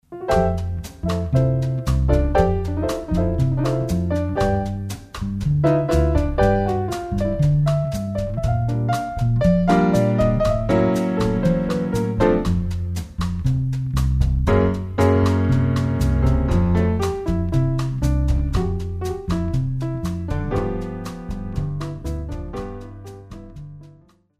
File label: Instrumental